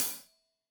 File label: Hat Loose, Hi-Hat, Cymbals Stereo